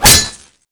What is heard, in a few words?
machete impact world, weapons